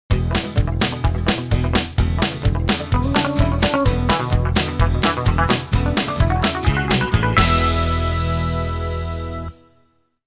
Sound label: STING